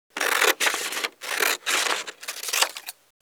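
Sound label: scissors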